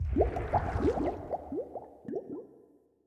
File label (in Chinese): 气泡柱漩涡环境声; ambient